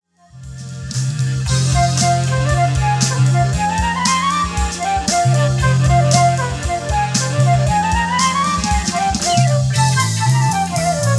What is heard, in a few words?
hip bop flute